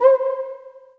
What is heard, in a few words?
Kawai K11 Low Cuica